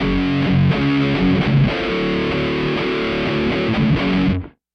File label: guitar no effect